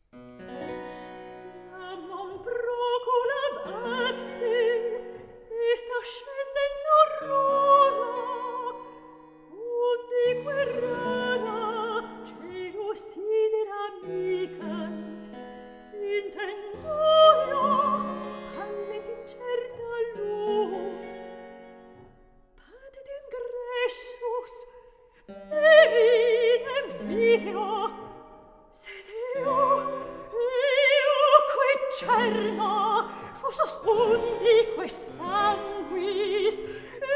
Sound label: Solfeggio; Jam non procul ab axe